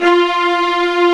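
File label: ARCO VIOLI, Tremolandi Violins; E-MU Producer Series Vol. 2 – More Studio Essentials; Composer; Tremolandi Vlns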